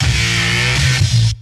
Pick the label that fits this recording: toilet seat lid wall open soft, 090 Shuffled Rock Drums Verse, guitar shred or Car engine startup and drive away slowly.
guitar shred